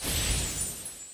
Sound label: MOVE; C64 Dreams; Multi-Sound Default